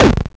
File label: weapon